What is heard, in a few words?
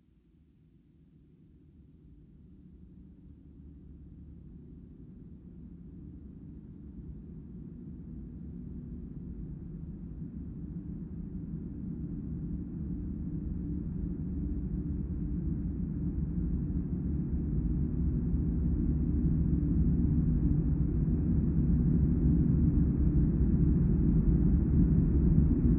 Piano In Slow Motion